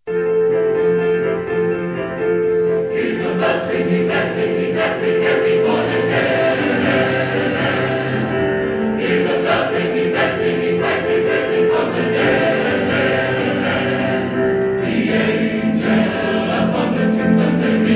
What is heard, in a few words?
Christian, Choir